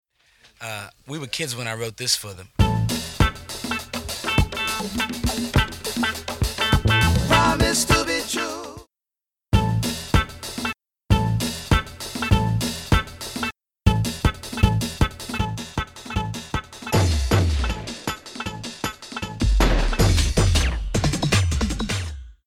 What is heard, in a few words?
Guitar & drums loop